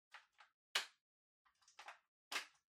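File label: Ceiling Light Far Remote Control Battery Insert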